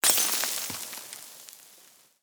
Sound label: glass broken